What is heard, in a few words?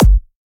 Dr Kick